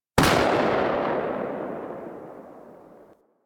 Shotgun Tail A; Weapons; Warframe; Tenno; Alchemist

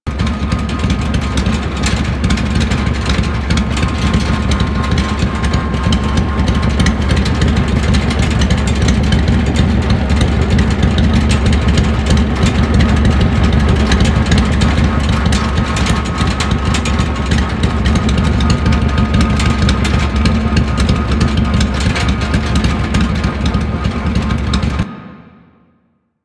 Skiff Engine Loop Idle, Vehicles; Warframe; Grineer Skiff Player Vehicle

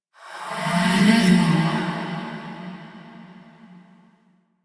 Hand Compass Vocal; Warframe; Duviri

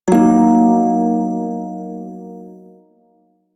Shawzin Yo Chord; Prime Shawzin; Warframe

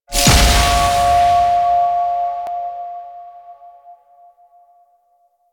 Protective Shell Cast; Warframe; Citrine

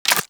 Bullet Impact Debris Wood

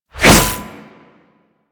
Briefcase Scythe Whoosh B; Crp Briefcase Scythe

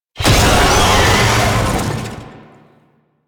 Tank Infested Vocal Pain; Enemies; Barks; Warframe; Lasrian

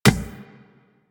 Beach Ball Floof Kick

